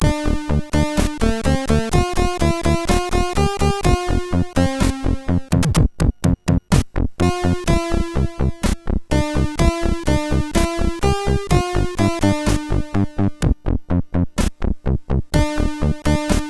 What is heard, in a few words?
Saddy Tune, sid MOS6581R4